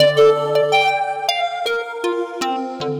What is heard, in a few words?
Lead Spook pop, Drum & Bass Keys; Sonic Foundry (Sony Creative Software); Knutrix Drum & Bass